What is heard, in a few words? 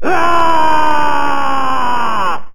aaaaah